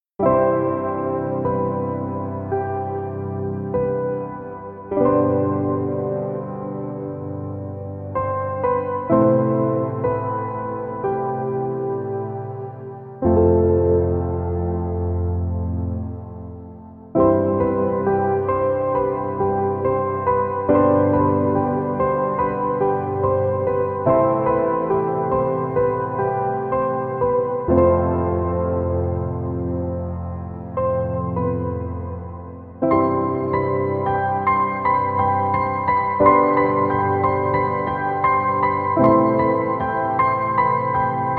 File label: Piano; Piano Times at Semaphore